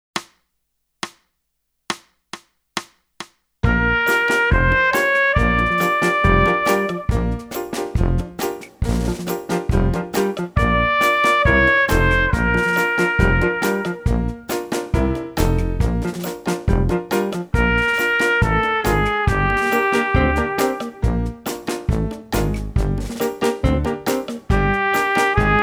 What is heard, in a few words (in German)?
Echo Ubung